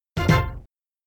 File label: Bonus Add; Indiana Jones The Pinball Adventure; Williams; arcade